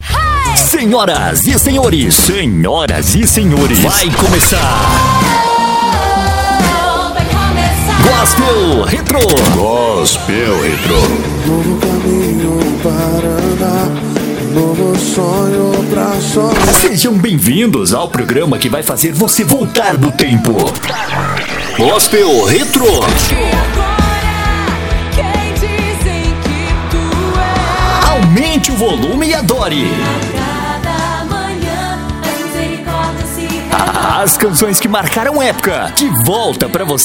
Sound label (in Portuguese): Gospel Retro